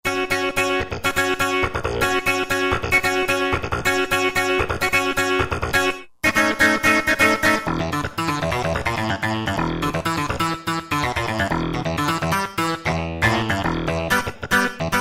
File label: Flip Clav; Factory Patches